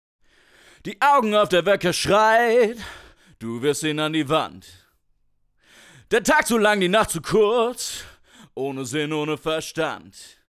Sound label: Vocals 6dB Komp